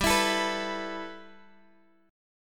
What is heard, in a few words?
G 6th Add 9th G Guitar DADGAD, chord